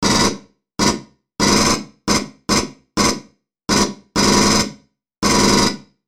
M41A Pulse Rifle; FXHome; Bullet and Gun

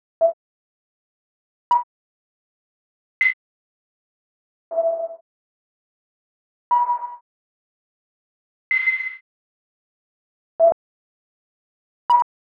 Single Tones Whistle, Digital Sounds; soniKSound; HUD, Beeps, Devices

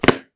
book Place; Kenney's Sound Pack; RPG Audio

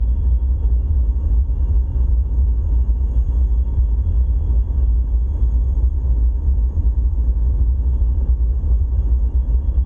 space station loop, Industrial Ambience

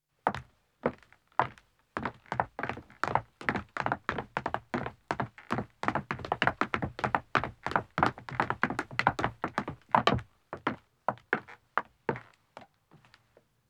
Wooden Stairs Descending, 1 Ma, Footsteps; BBC Sound Effects Library; Footsteps II

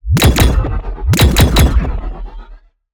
Steampunk Weapons; SoundMorph; Back From The Past

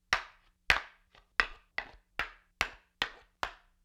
Axe Spear Haft on Haft; StillNorthMedia

